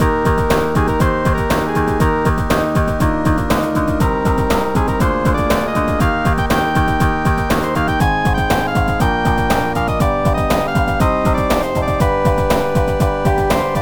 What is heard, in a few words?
Castle Stage Loop; ravva and the phantom library